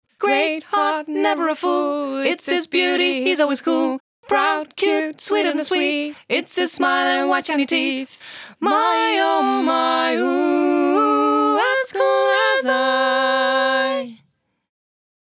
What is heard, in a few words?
Reverb; 112dB Redline Reverb; Zucchini Sisters I